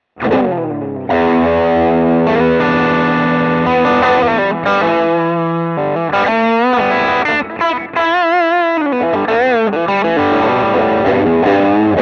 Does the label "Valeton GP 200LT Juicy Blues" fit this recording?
Yes